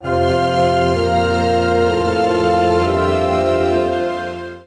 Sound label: Modern Calm; Civilization Revolution